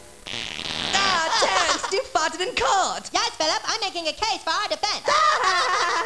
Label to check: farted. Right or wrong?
Right